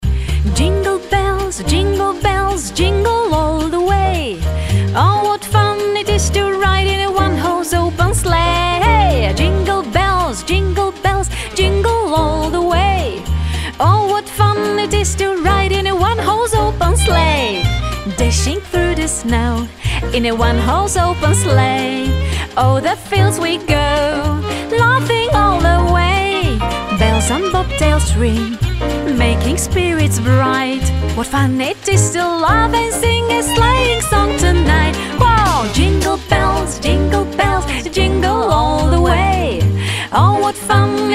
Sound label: jingle bells